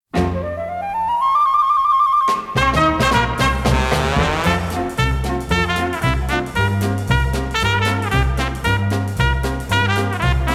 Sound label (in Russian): instrumentalnyiy ansambl